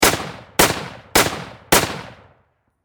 Warfare Rifle M1 Garand Semi Automatic Four Shots Close Perspective; SFX Bible 6